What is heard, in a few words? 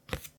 Rollover Hallway; Among Us